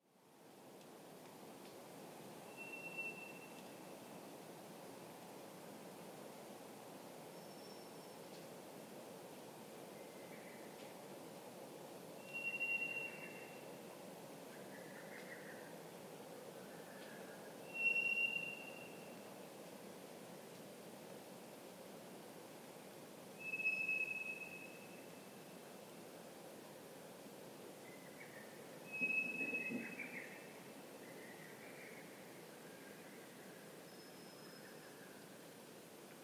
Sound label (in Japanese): toratugumi, bird